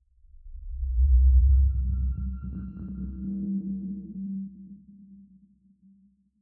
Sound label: sci-fi display transmission low